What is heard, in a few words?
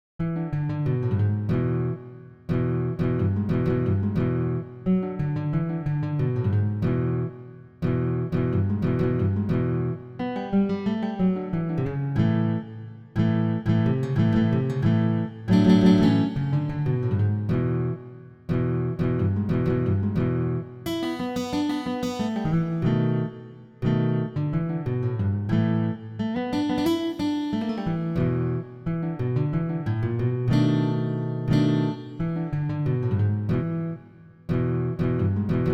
GroovE Rock